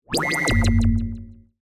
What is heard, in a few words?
Pulse Ray, Scifi; Battle (Sound Effects:Science Fiction); BlastWave